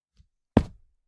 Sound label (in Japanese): 布をポンポン叩く音